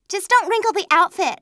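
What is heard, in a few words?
whine; TrueCrimeStreetsOfLA; XBOX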